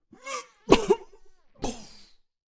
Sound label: Wizard Death Vocals